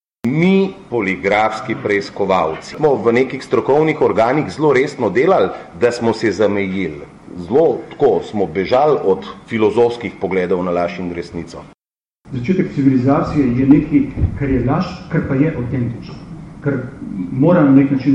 jingle

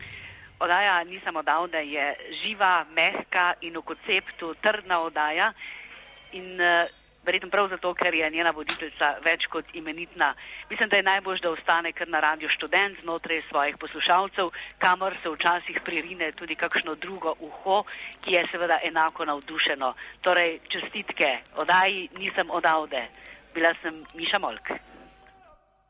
JINGLE; nisam ja odavde

